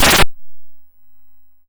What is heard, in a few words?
Snare, YM, CHIPSHOP HITS DRUMS; ChipShop Deluxe